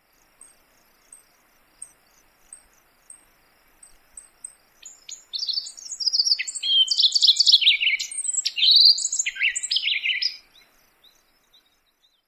flight song

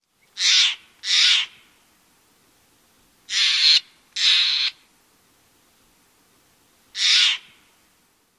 calls

